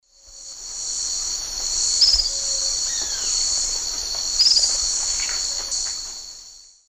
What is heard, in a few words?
Chelidoptera tenebrosa, calls; S. America; Eastern Lowlands